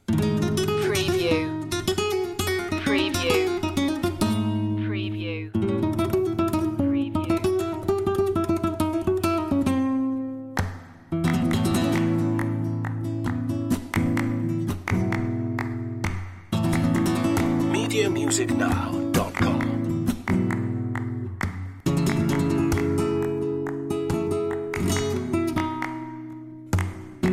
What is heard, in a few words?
solea por buleria